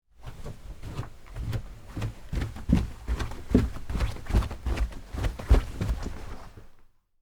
Stairs Down Carpet, Footsteps, Stairs, Down, Carpet; BlastWave